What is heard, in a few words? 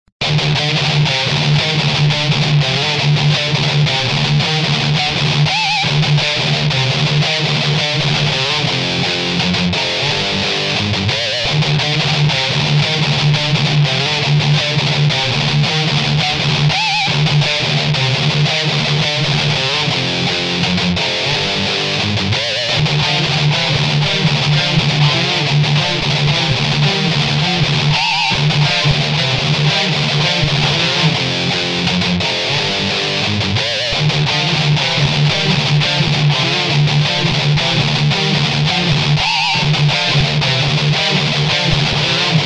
hammer head bridge dirty